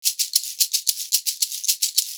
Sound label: 110 SHAKERS, Percussion Loops; USB Soundscan vol.36; AKAI